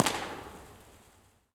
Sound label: Causeway; Stanley Park; Impulse Response; EchoThief Impulse Response Library